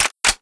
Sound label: reload MP7; csof